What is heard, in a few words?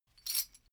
metal chain movement single